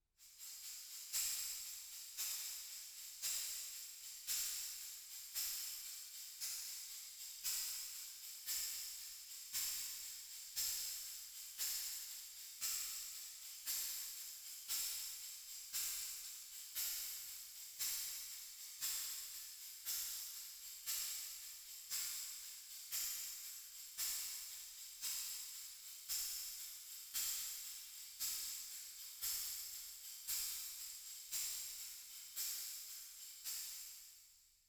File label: Tambo